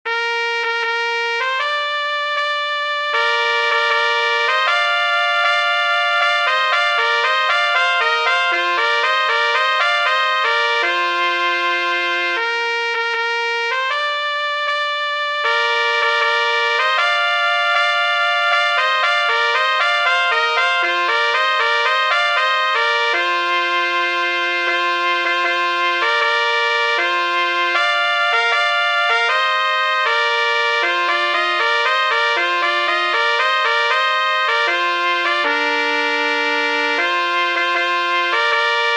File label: tambourine